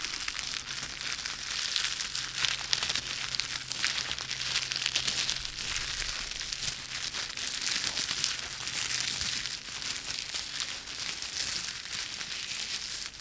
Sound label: Leaves Sounds